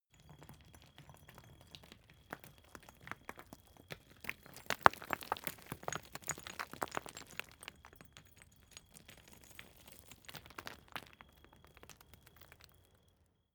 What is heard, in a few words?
stone medium landslide; natur-tiere; steine